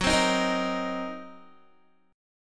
Gb 6th Flat 5th Gb down Guitar; Listen to Gb6b5 strummed

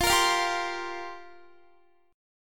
F Suspended 2nd Suspended 4th F down Guitar

